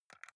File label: console controller xbox arrow key press fast